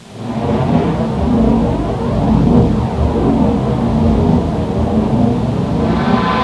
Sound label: synthetic take-off; Sound Effects for Multimedia - Volume 1